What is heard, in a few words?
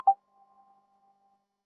digital soft click